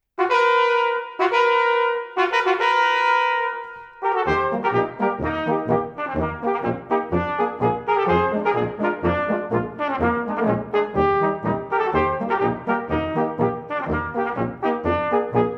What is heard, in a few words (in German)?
andorfer polka